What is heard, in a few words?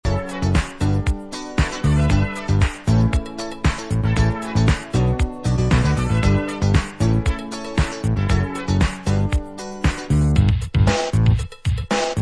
instrumental